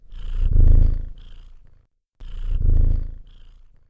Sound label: purring purring